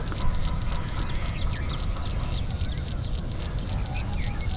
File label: Song of a Plain Prinia, Maharashtra; Shivam Chitnis and AK